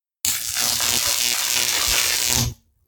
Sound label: Jacobs Ladder